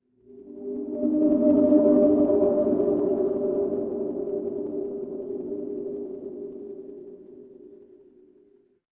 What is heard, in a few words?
horror wind whisper